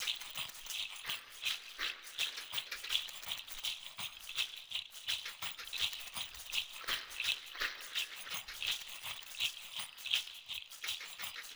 Water Mix; Crow Hop (Weemmarategwa)

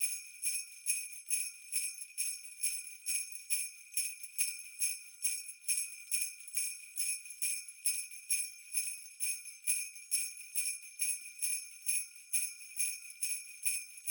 Sleigh Bells; Nightsong (Hobeea)

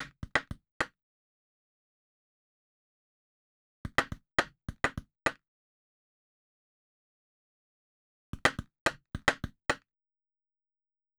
Small gourd; War Cry (Cayovinto)

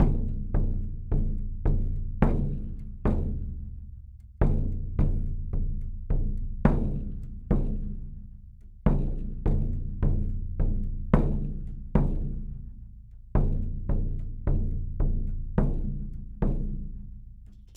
Painted drum leather; War Cry (Cayovinto)

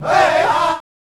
Navajo vox hit

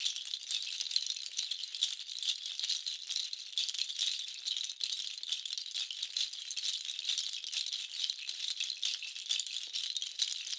Deertoe shaker; Warrior (Tohoqashaw)

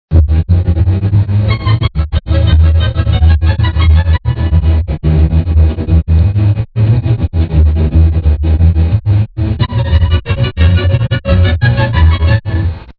clue music

